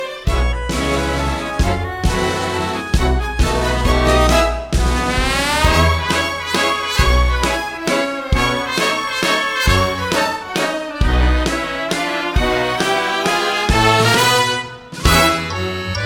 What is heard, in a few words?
audition accompaniment